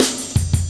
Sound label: heavy live ride cut, drum loops; Zero-G Jungle Warfare 1, 2 & 3